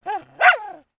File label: Pet Dog Small Happy; creatures; pets; Lego